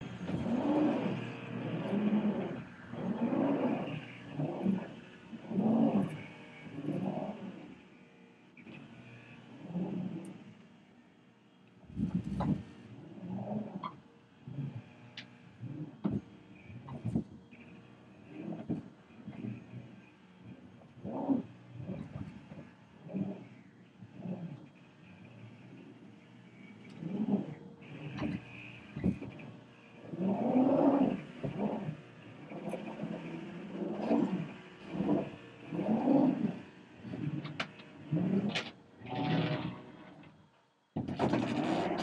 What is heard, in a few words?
vacuuming upstairs